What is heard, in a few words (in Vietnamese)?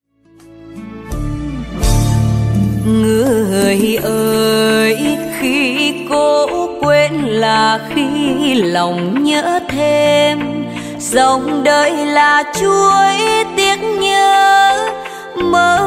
bolero beat